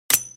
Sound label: frag pin